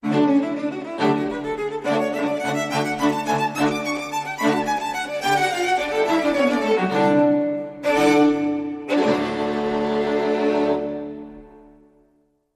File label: Sting; Blue Danube Waltz